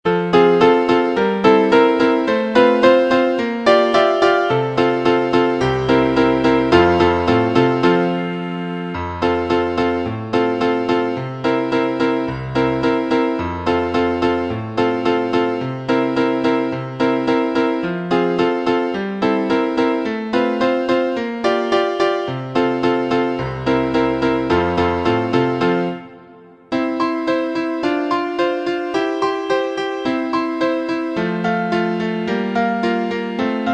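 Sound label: accompaniments, Trumpet in F; concone; Rudimentary Transposition Studies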